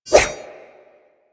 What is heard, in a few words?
Kunai Whoosh